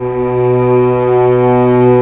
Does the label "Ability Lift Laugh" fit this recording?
No